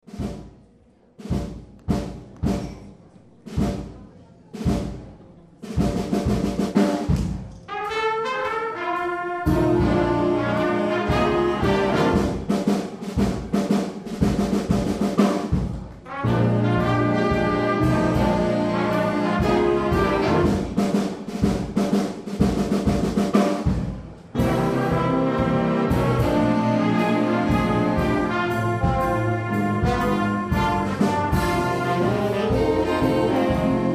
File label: Blues Big Band